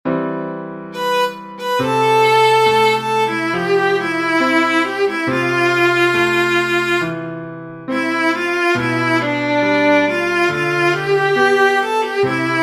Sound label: viola; 生命之道